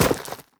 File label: step, footsteps player debris